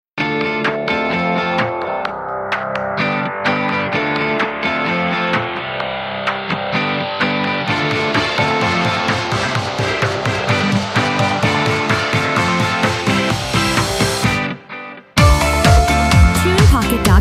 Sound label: Pulse Intro; TunePocket